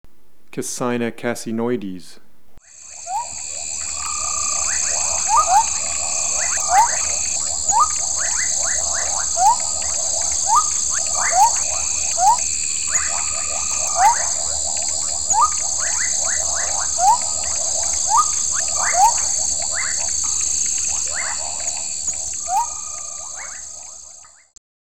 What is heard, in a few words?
Kassina cassinoides